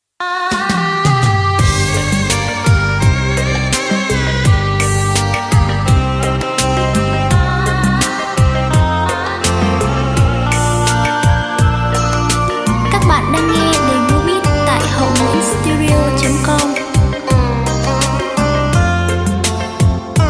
Sau le bong beat

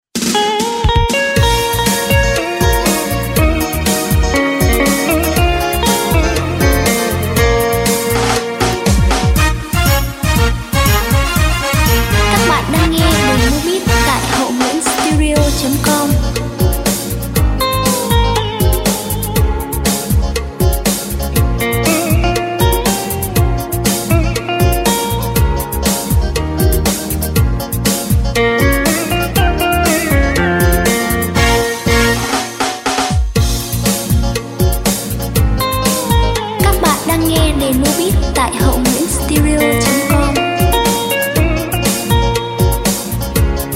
Trang hon tui beat

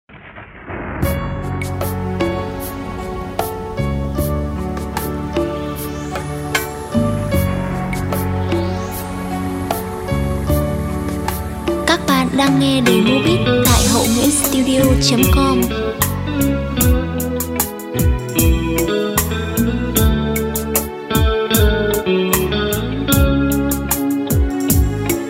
beat

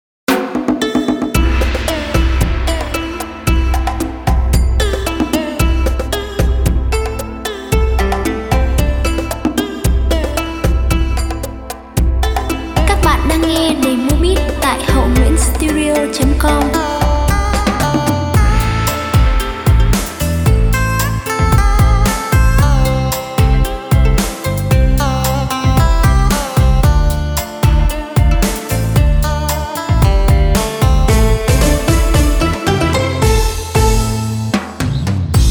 beat

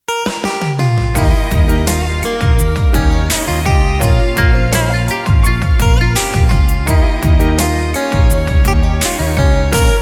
beat

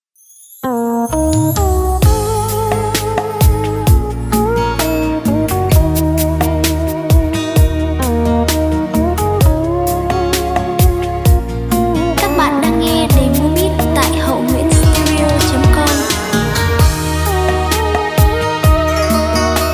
Dieu dan ca buon beat

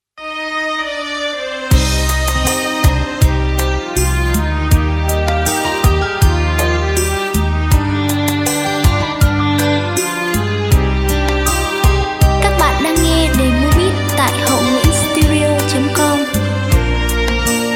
beat

